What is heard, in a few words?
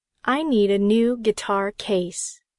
guitar; I need a new guitar case.